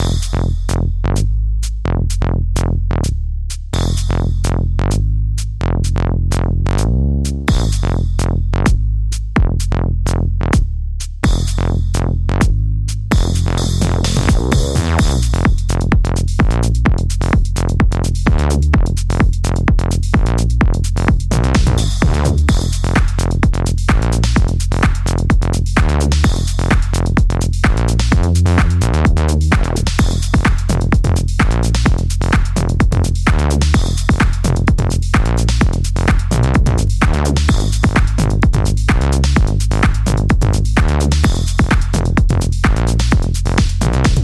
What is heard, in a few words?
DnB House; dinopark